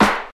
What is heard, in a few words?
high quality studio clap sound c sharp key